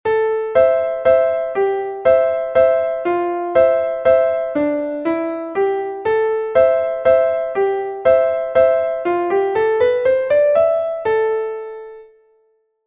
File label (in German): Melodie moll